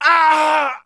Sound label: hit, pain; mohaa